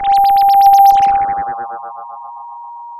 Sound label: Sound of a police car siren